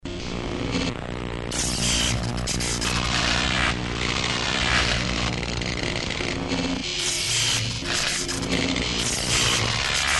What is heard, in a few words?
zap and hum loop; eChair